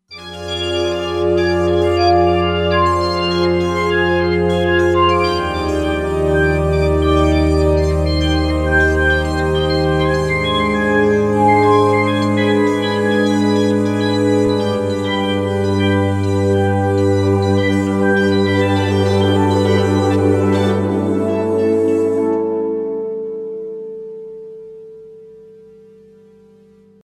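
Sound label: Pad Amethyst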